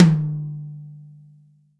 tom, Real Drum Kit, funk kit

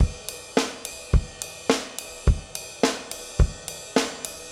Garage Funk Drums, 70s Analog Funk; Sonic Foundry (Sony Creative Software); What it Is!

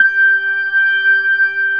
G4 SLOW, DISTORTN.B3; Northstar - New Gold VOL-1

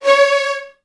C#2, Strings, VN 16TH; ILIO; Kirk Hunter

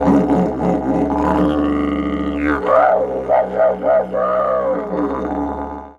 D2 WILD, Didgeridoo; Big Fish Audio - Didgeridoo and Other Primitive Instruments

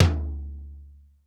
DRY TOM L; R8M TOMS; East West; Global Sounds

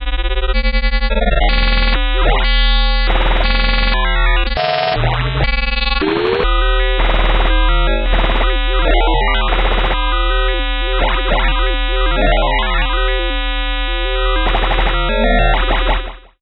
Atmo FX; Sonic Foundry (Sony Creative Software); Leo Cavallo Bass Taster; Special Fx

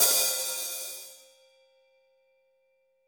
HH, Drums; Bob Clearmountain Drums I

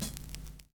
VINYL HH, Drums Dance & Groove; USB Soundscan vol.11; AKAI; VINYLKIT

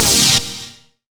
Stab C3; 90 sSampleCDs; Club Techno

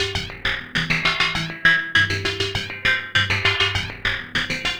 synth 100 phraze E G A C; Transmission-X

